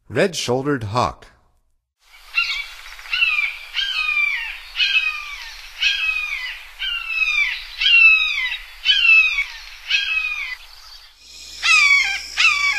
Red-shouldered Hawk, Songs of Birds of North America; Stokes